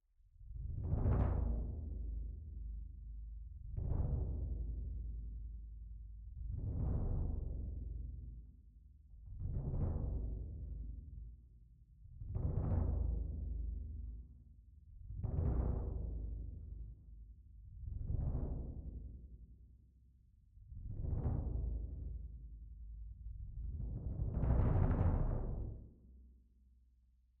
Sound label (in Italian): Cassa Front, piano